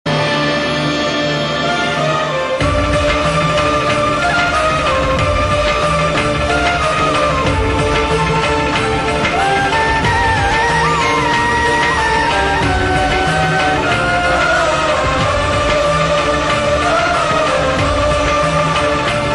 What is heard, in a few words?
Mahadev Background